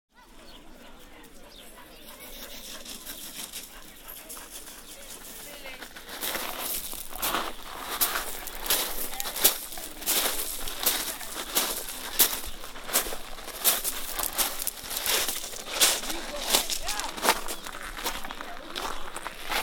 dog running - footsteps; whitstable

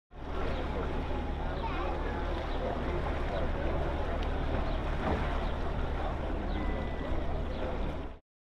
boat noise; whitstable

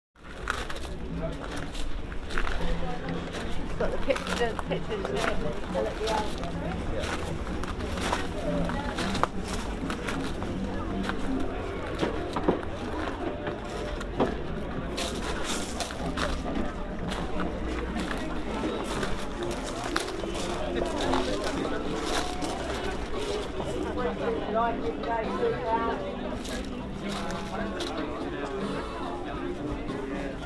Harbour market; whitstable

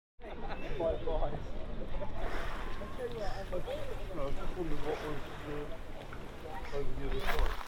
prom; whitstable

